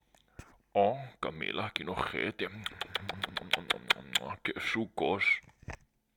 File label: mmmmmmmm